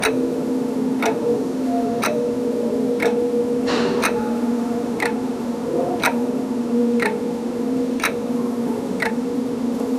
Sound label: soft museum clock tick